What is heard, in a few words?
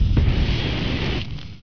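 Flamethrower; machines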